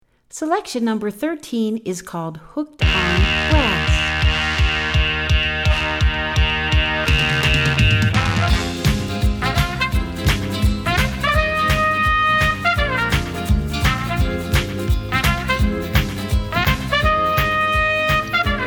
Hooked On Brass

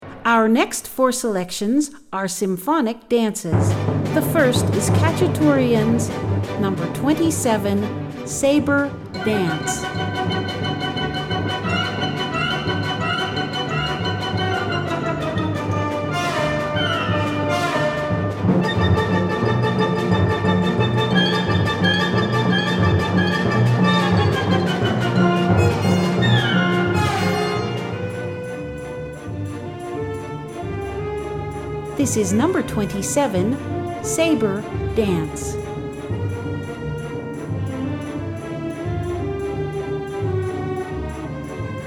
Sabre Dance

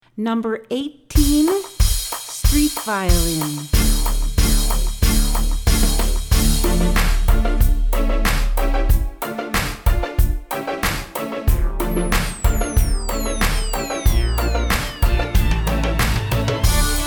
Street Violin

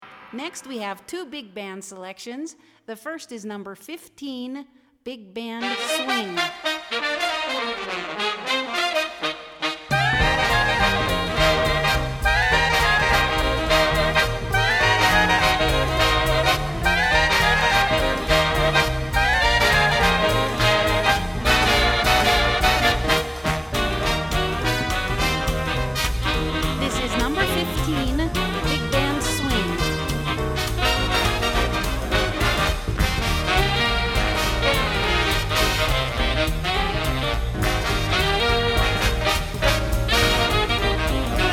Big Band Swing

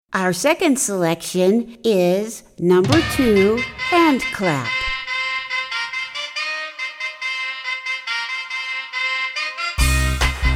HandClap

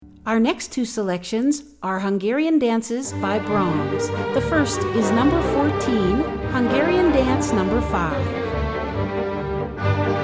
hungarian dance 5, classical

